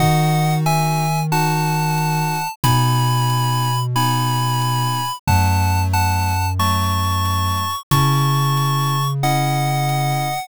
bgm mellow

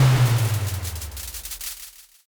firework burst

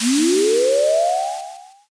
firework launch

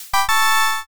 next preview

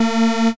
wrong buzzer

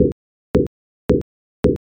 fs carpet

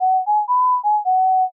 matsuri fue

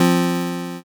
power chord

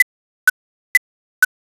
ticktock

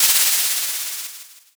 scroll open

